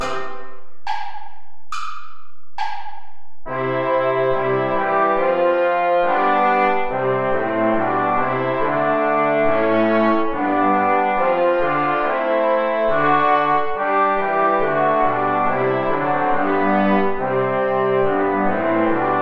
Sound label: play along without trumpet